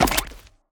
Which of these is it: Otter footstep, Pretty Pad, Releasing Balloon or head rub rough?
Otter footstep